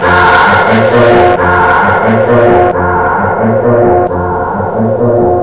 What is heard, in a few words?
Halleyah; Amiga; backbone1